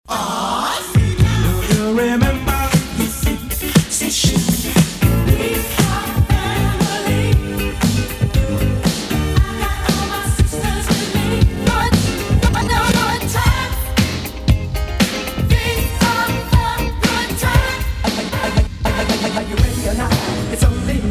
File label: jingle